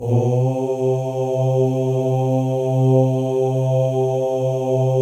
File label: male ohs; Spectrasonics; Symphony of Voices